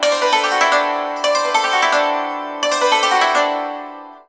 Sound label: YANG CHING, Heart of Asia; Spectrasonics